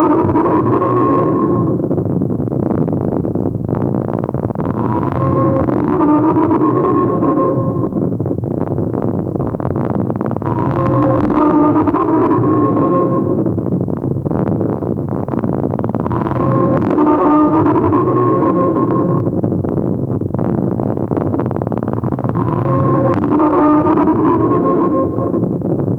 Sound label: old magnetic tape loop; abstract